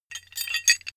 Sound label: metal handling pieces